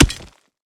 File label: pickaxe hit